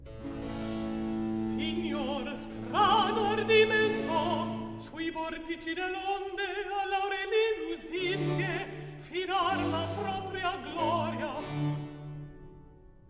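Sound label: Recitativo, Handel opera; Act 2; Signor, strano ardimento!; Handel - Rinaldo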